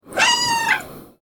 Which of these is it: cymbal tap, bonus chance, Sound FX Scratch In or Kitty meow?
Kitty meow